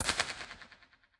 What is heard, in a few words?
Scala Top; IR Scala; Mono